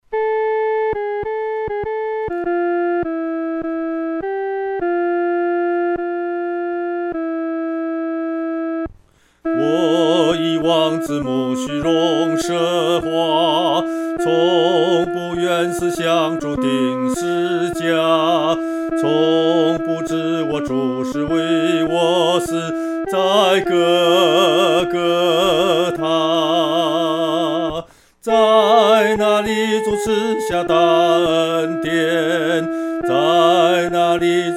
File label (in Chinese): 独唱 第二声; 在各各他